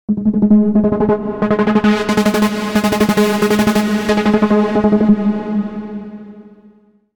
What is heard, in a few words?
Analogue Gate, Korg